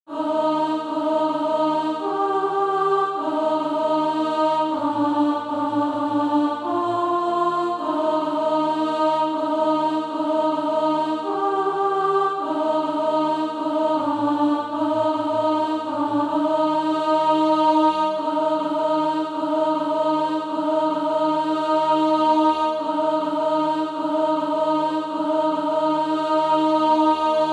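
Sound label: alto, SATB Practise Music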